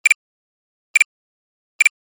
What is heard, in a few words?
Military radar antenna scan beeps; Military Equipment